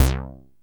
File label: TEISCO, BASSES